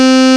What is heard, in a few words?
tri hard+FM, sync+FM pads; Zero-G Ambient 1